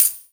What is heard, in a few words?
Metallic Rattle; Drums; Misc Percussion